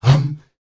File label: hum hum, S. African world voices; ILIO; Vocal Planet